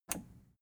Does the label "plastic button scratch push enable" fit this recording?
Yes